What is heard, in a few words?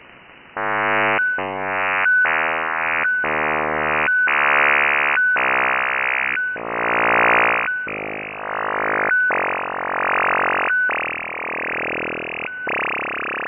IONO 16x64 SWEEPS 3kHz